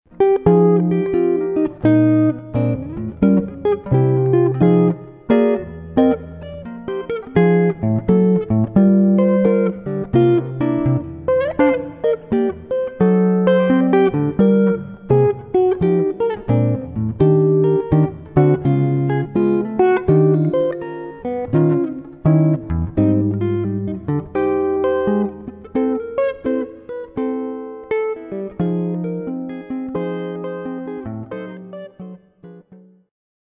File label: solo guitar